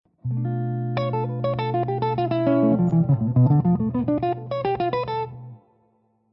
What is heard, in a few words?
Locrian nat. 2 Jazz Lines, guitar